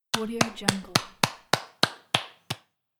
hand claps

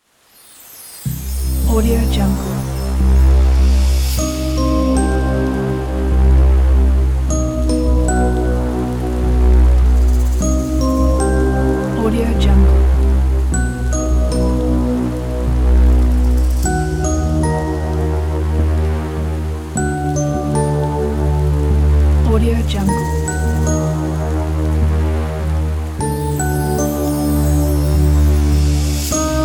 for halloween

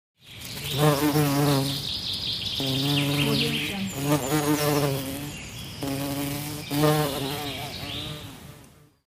bee

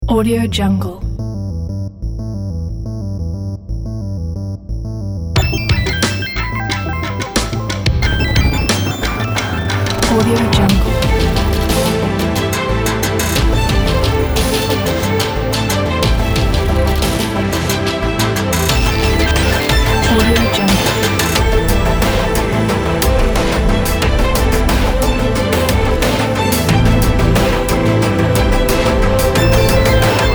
electro cinematic trailer

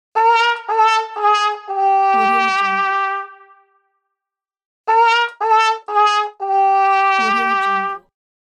cartoon trumpet wah wah fail

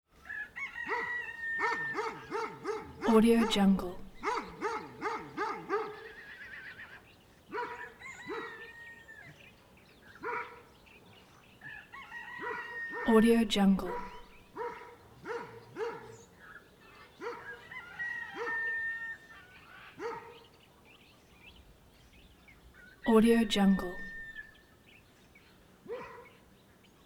farm animals dog barking rooster crows

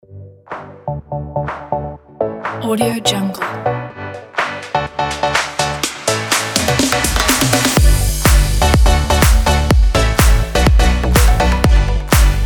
dance party pack; maikonmusic

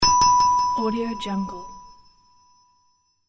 delayed glockenspiel ding

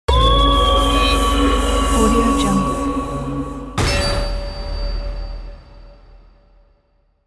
horror movie logo

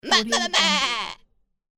cartoon goat win shout

